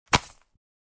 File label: Money pouch hand catch; purse